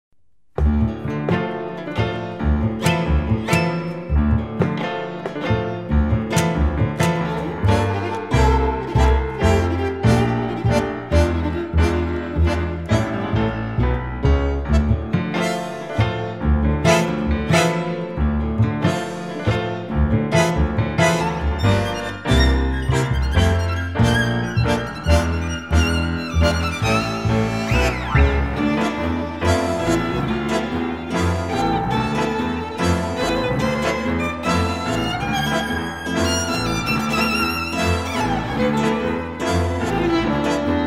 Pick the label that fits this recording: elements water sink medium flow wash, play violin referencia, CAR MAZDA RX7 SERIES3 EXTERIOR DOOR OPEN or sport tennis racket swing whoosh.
play violin referencia